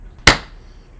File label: clap